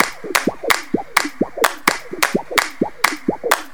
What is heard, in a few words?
Latin House Loop 128 BPM; Vengeance Samples